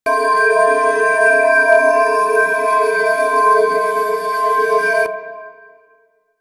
Storage Container Hidden Loop; Warframe

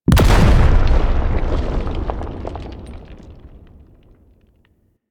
Rail Jack Asteroid Explosion B; Hazards; Warframe

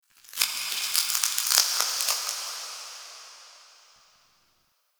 Champ Crypt Stone Crackle; Ambience; One Offs; Champion Crypt

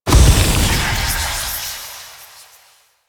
Shadow Smoke Death; Warframe; Duviri

